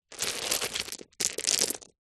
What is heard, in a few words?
foley pebbles many small movement hand drop at end